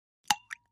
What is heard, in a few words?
Splash Sml Plop Drink